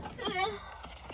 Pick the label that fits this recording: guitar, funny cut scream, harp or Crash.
funny cut scream